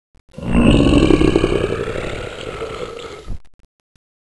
alert; svencoop; AoMDC

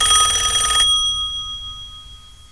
ring, ambience; svencoop; blackops

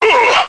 stun; slender; chaosinsugunner

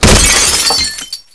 bust glass, debris; svencoop; AoMClassic

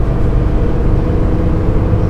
bus ambience; svencoop; desertbus

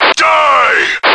chase; slender; chaosinsugunner

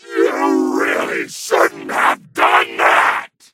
stabbed; tf2; freak fortress 2

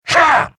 attack; slender; marauder

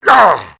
stun; slender; marauder

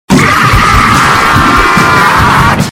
scream; tf2; freak fortress 2; new spookmaster; repossession

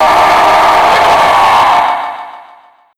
scream; tf2; freak fortress 2; the madcap

